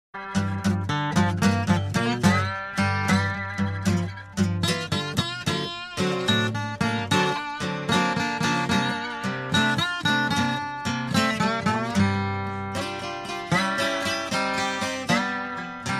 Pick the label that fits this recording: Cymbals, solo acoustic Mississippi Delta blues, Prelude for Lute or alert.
solo acoustic Mississippi Delta blues